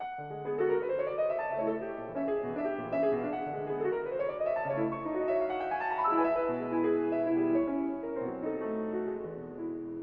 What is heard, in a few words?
Papillon, Piano; Op.74 N.1; E.Grieg